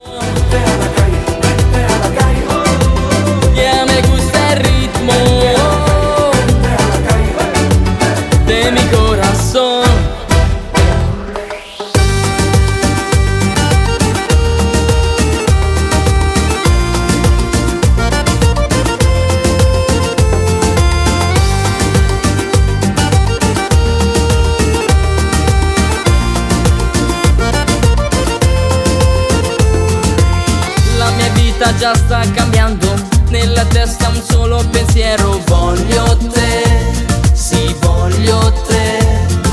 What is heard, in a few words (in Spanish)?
mix reggaeton